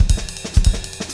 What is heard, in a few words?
DOODLE, Drumscores, LICK; Steve Gadd Drumscores; GEMS